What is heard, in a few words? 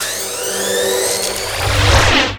Portal; raving rabbids travel in time wii gamerip 2010